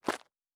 Dirt Run Surface, Footsteps

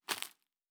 Leaf Walk Surface, Footsteps; Survival Game Sounds - Lite Edition

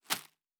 Leaf Run Surface, Footsteps

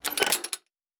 Metallic Gear

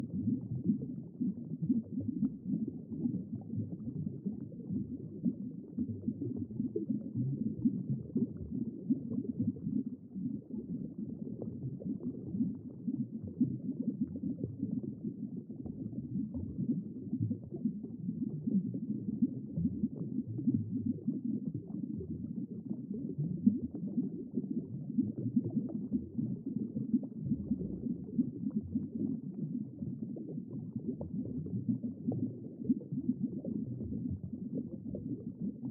Underwater Loop, Environment; Survival Game Sounds - Lite Edition

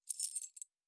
Keys; Survival Game Sounds - Lite Edition; Doors

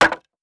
Wood; Survival Game Sounds - Lite Edition; Environment

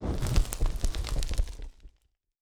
Fire Burning; Survival Game Sounds - Lite Edition; Environment

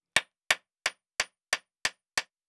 Nail Wood, Crafting; Survival Game Sounds - Lite Edition

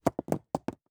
Rock Rolling; Survival Game Sounds - Lite Edition; Environment

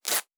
Cloth Ripping; Survival Game Sounds - Lite Edition; Objects and Items

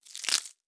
Bone; Survival Game Sounds - Lite Edition; Combat and Hunting

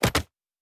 Build Interface

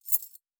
Keys; Survival Game Sounds - Lite Edition; Doors

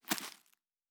Leaf Walk Surface, Footsteps; Survival Game Sounds - Lite Edition

